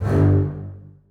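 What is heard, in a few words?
basses staccato f#1; Sonatina Symphonic Orchestra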